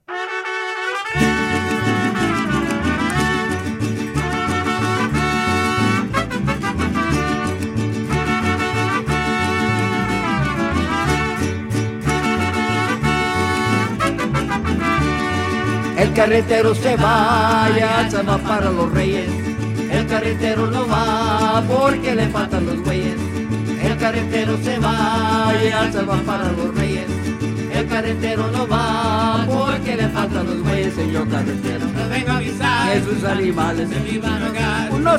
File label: Mariachi